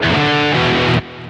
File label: Pickup Guitar Riff; Pickups